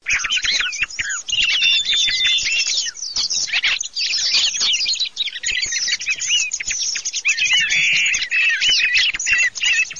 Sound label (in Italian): TORDO MIX CAPANNO